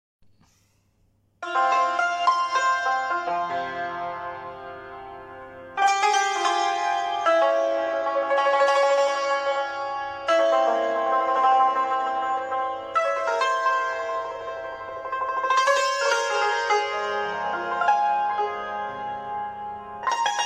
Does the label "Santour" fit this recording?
Yes